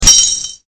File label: COIN